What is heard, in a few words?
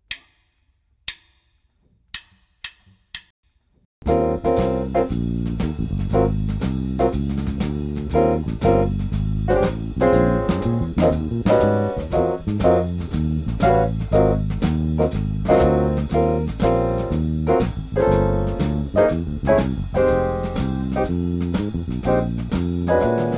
12b RIFF BLUES TRACK